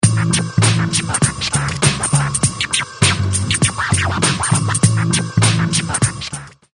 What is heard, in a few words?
knockin down your door scratch